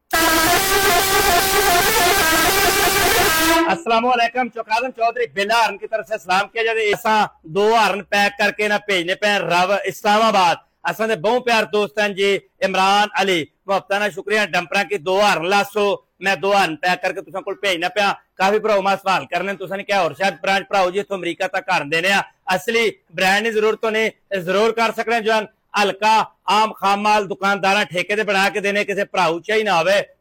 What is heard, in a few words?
horn